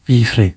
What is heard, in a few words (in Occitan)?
pifre